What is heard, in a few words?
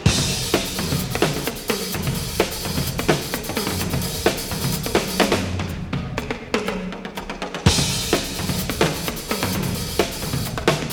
gg drum